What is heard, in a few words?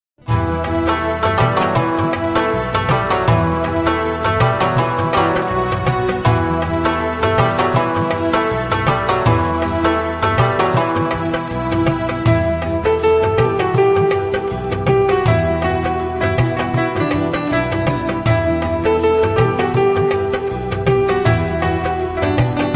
ringtone background music; padman